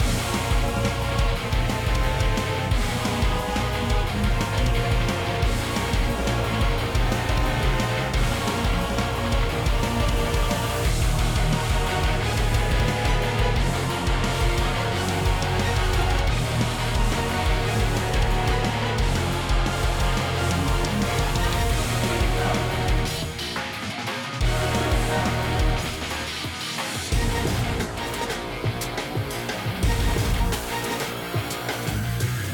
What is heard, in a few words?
Battle Boss Ruin castle